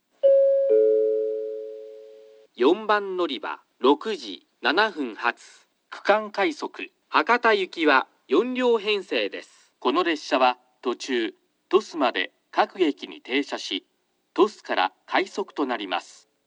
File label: kurume regional rapid hakata, portable recording; 4番のりば案内放送（区間快速 博多行き）; ※鳥栖から快速